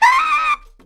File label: SQUEEL, Booty Brass; E-MU Formula 4000 Series Vol. 1; Hip Hop Nation